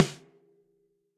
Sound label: Snare Hit Classic Muffle; Drum Hits; Standard Hits; Ableton; Factory Packs; Session Drums Studio